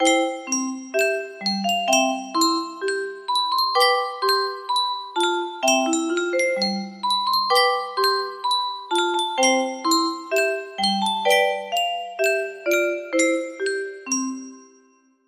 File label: music box, music box melody